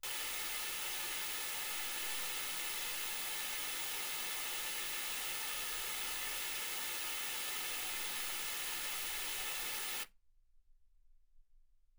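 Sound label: white gaussian noise 48kHz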